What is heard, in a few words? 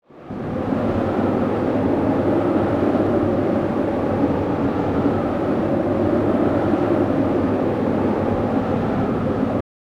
Ambient - Strong Wind; mother 3